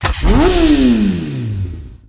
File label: Mount, Motorcycle; Warframe; Vehicles; Motorcycle Ninety Nine